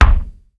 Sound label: JAZY BD, JAZZ DR